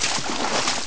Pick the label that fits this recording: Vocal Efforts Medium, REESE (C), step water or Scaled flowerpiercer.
step water